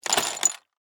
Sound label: Game Pieces, Drop, On, Board; Sports